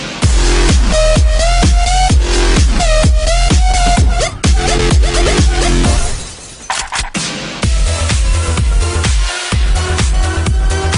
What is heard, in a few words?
electronica mashup mix, ringtone